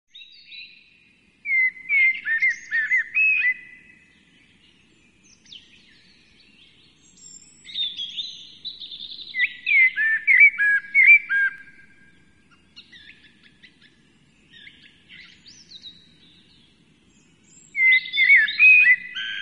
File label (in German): amsel